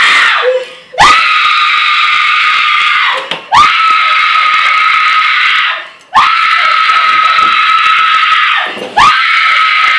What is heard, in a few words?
aaaaaaaaaaaaaaaha